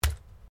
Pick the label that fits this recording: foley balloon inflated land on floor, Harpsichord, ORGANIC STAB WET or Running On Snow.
ORGANIC STAB WET